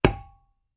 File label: Metal Impacts Dull, pipes